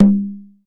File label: CONGA LO; Univers Sons; Basicussions